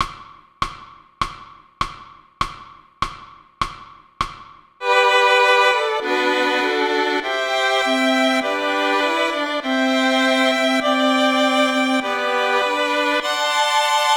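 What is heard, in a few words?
Violin Melody Chord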